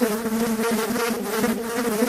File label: Fly Buzzing